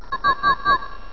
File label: cricket; FishSim